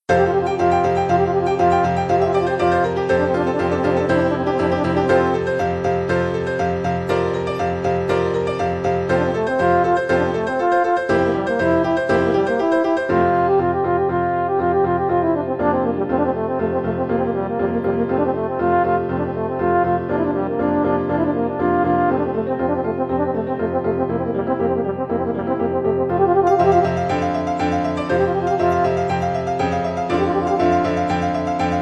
Capriccio Espagnol, french horn

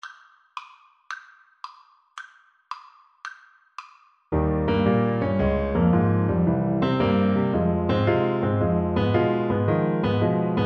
hora fetelor, Trombone

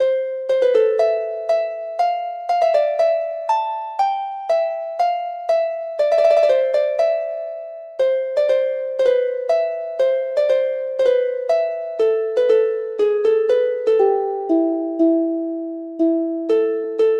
harp

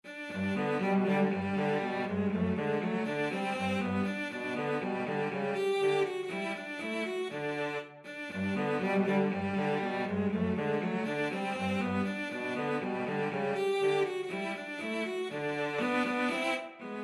VLC DUET, cello duet

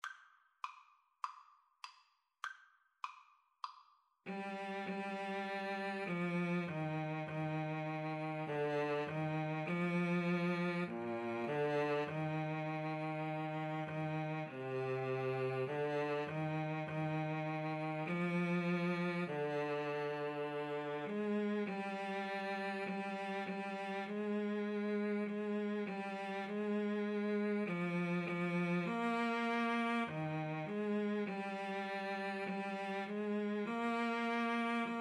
it is well, Saxophone Cello Duet; as, vc

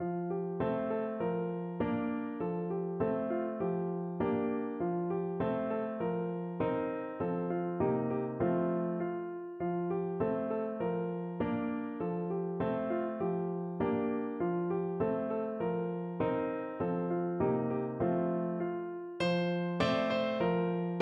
mattachins, piano

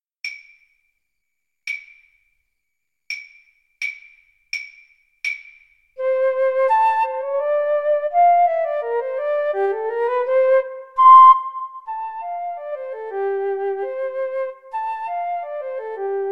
Flute Clarinet Duet; euphonic sounds

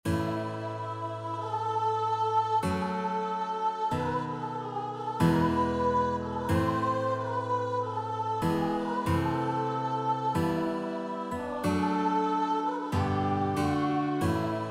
GT VOICE

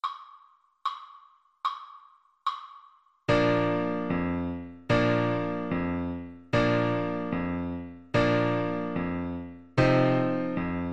bizet carmen toreador flute

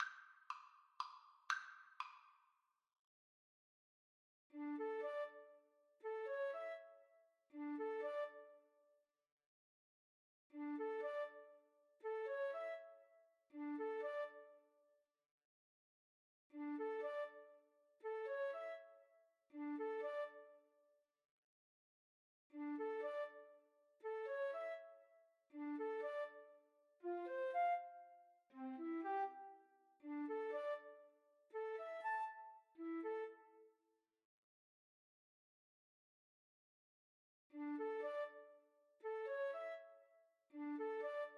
Flute Duet

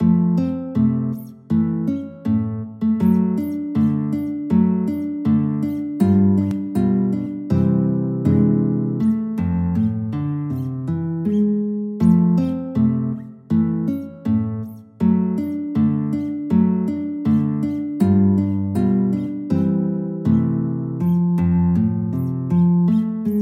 guitar

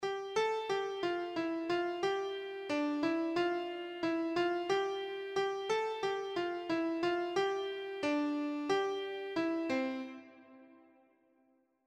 london bridge, keyboard

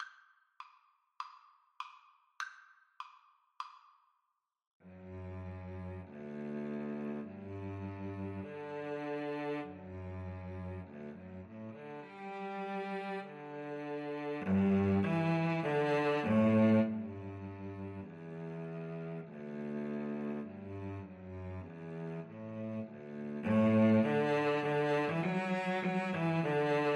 loch lomond Cello duet

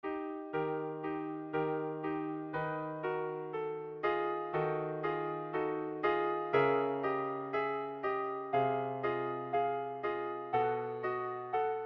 flute

